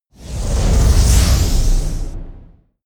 whoosh air

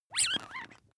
bath duck squeeze soft

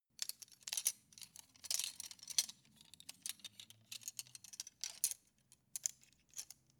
cutlery plastic multiple rattle long

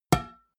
glass door small hit damped

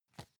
magazine page handle

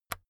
keyboard external key hit release

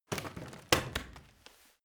rotten wood break

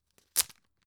tissue open hard

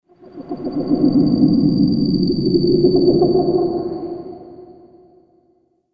fantasy creature soft

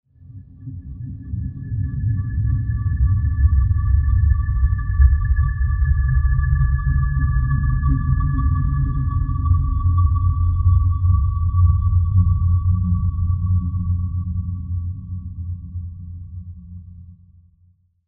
deep swell

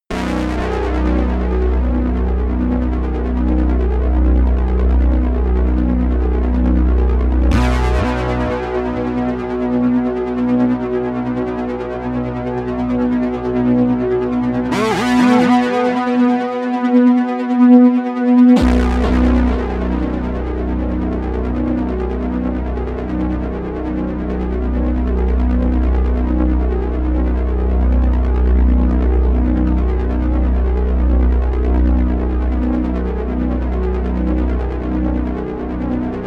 aggro bass

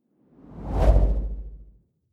whoosh impact soft wobble bass

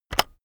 keyboard external enter press very fast heavy

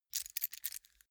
key ring large grab soft

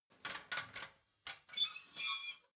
ladder metal handling fold

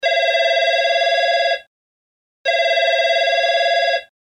us phone

